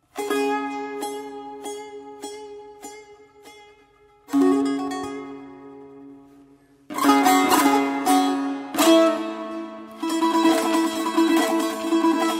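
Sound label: Taknavaziye Tanbur, tanbur solo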